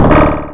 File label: roar; atari